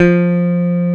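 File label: electric bass pick F2; AKAI S6000 CD-ROM - Volume 1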